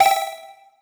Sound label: coin